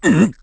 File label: Sumo Push; Sumo match: Bo pushing Link